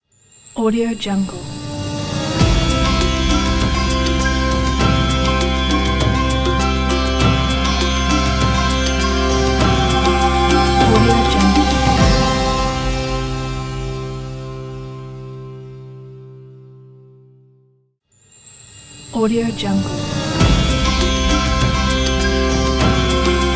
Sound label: ramadan logo